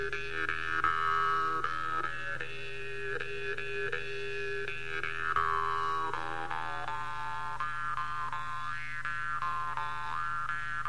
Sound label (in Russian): vargan; etno